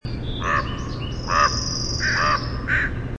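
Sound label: common raven call, Corvus corax; Passeriformes Corvidae; BC; UVictoria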